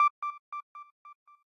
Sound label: arp